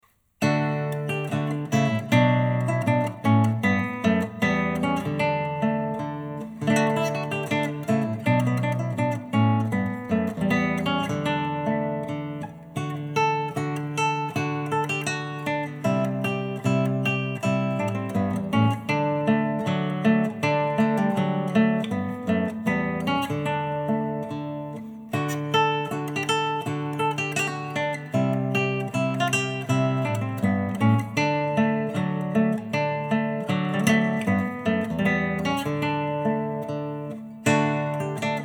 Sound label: Jig, classical guitar; Kemps